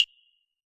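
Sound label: house kit claves; Dr 202 Kit 1; Percussion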